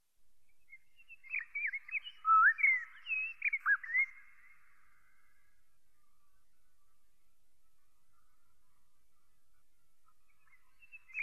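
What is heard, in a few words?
dunnock voice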